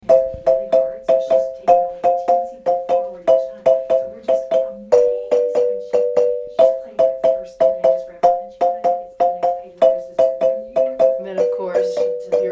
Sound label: high, marimba